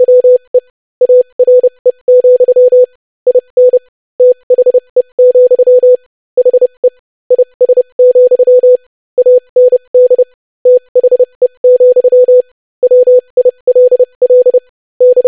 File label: basic phrase 25wpm